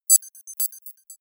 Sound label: computer sound, signale; soundpool